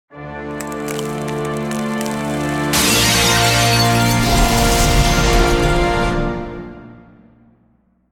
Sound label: MUSIC CRYSTAL BREAK HERO FREED; CRYSTAL LEVEL